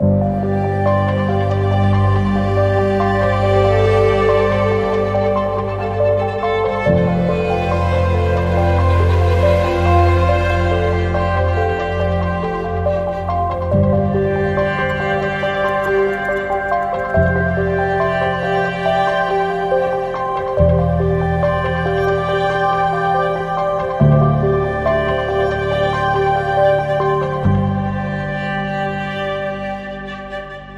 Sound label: Innovative Designs No Perc